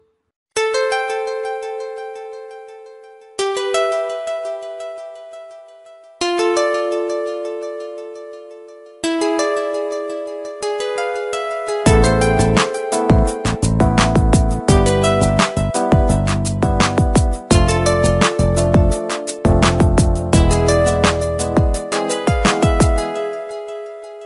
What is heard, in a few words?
Romantic Music Ringtone for Android